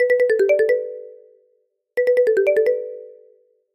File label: call Connecting